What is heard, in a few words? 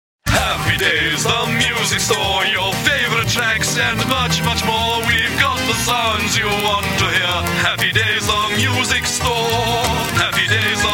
singing comedic